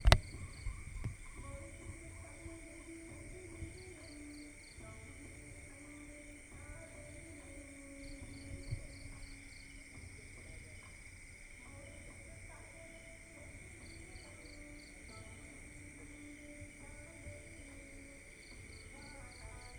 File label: night frogs, South India; South India street recordings